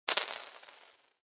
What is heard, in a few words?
Poker Chips Plastic